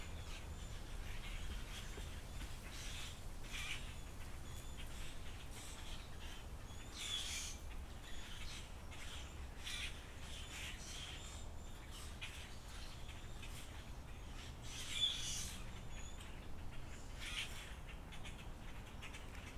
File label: birds in front yard